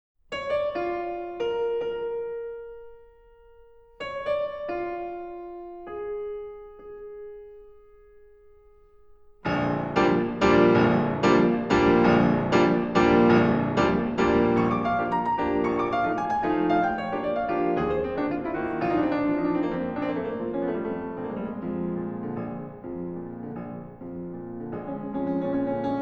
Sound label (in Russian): Фортепианная прелюдия Allegro ben ritmato e deciso; Гершвин